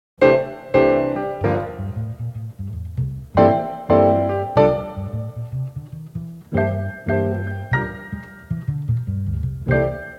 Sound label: Rhumba